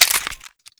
clip out, weapons USP

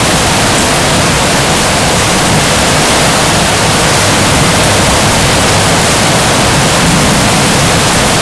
static noise

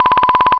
blip blip blip

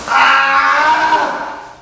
death; saitama

